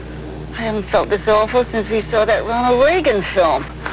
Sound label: AIR